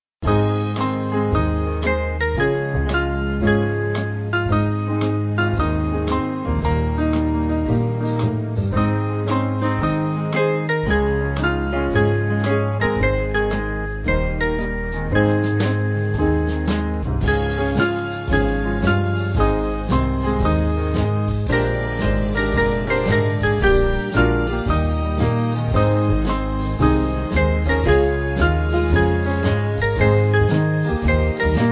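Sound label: Band, Music Backing Files for Churches; Swing Band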